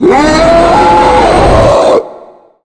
charger charge

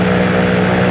plane sound

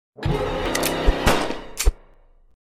gun kill

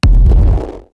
demonic spawn

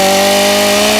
spun up, chainsaw

